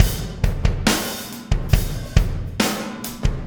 Impact Crater Beat, Processed Drumkits

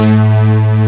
bass; East West - Global Sounds

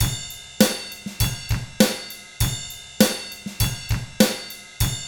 ROCK, Drums; ILIO; Double Platinum Drums 1

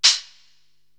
HH Lofi Open, Korg TR-Rack drum machine

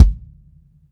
BD, Bassdrums, Roland TD-20

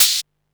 hat, Techno 138 BPM

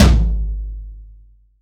F-TOM 20, PIN STP TOM

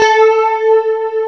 PIANOS, E.PIA A4

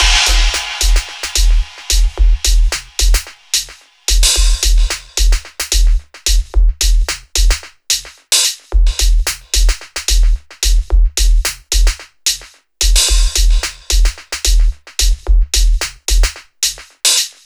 junk hip hop 110bpm

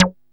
JU 106, SEQ-FX; Masterbits; Vintage Voltage

